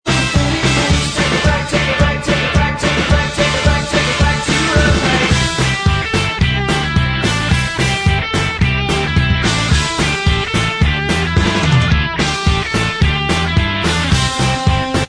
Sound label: Inst